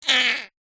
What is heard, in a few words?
cartoon fart narrow forced